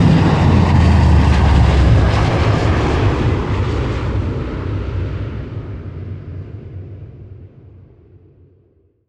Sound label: vertical takeoff